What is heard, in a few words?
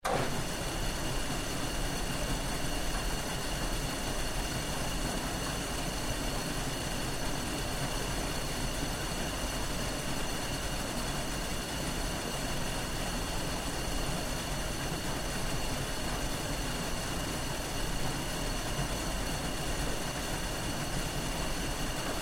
conveyor belt old; jetpackLearningPlatform; robot-knockdown